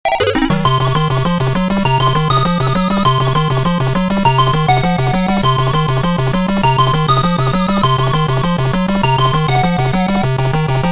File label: Invincibility, & Super Theme (8-Bit Soundtrack)